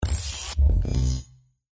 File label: combine tech startup